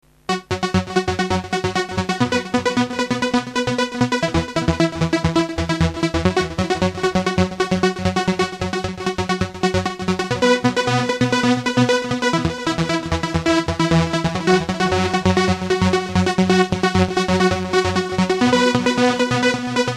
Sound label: arp mod